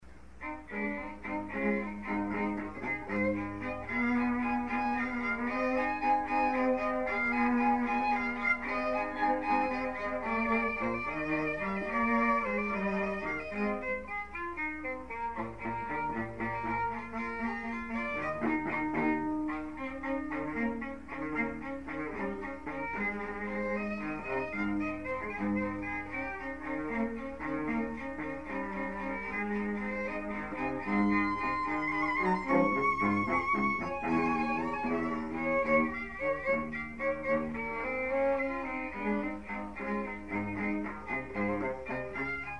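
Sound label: string trio; hopfe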